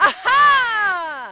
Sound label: yell; Splashdown; XBOX